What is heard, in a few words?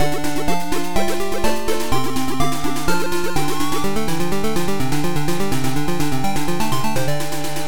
Game music; Water Works